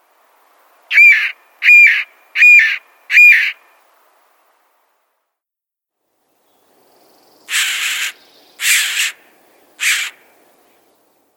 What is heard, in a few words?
Williamsons Sapsucker